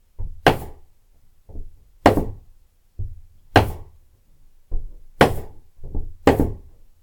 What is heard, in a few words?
automatic nailing